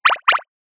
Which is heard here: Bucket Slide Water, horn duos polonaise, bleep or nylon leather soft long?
bleep